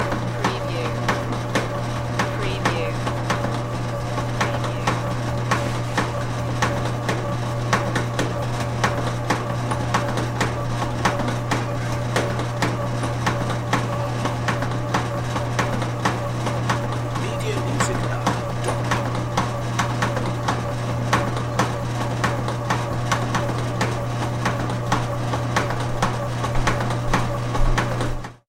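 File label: Bread Maker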